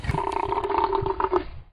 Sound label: trooper death